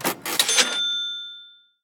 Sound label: Pay